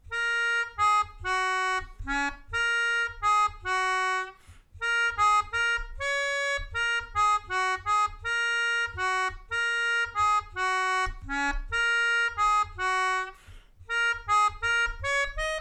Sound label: Melodica